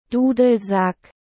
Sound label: Bagpipes